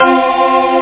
strings

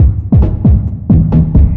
LOOP; Neocron

